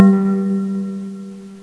Dong

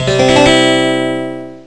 Harpsicord

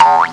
Better Boing

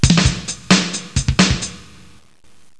Drum

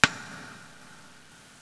Drum

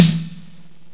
Drum

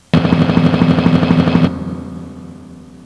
Drum Roll

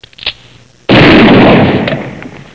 Shotgun Blast

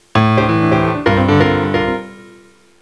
Piano

